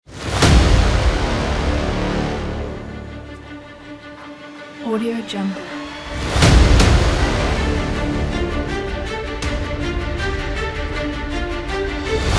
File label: cinematic dubstep